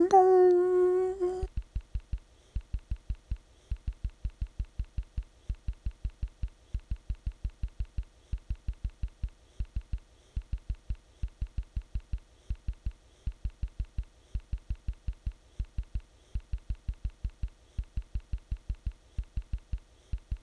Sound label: LEAD SINGING